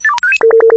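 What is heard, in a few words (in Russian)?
звуки оповещений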